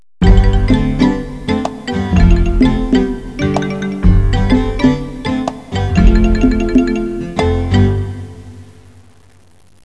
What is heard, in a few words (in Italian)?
musica newage